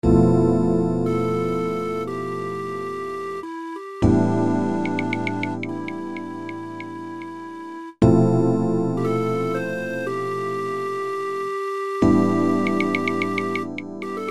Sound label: flute melody